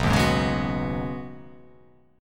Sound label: B 6th Add 9th B down, chord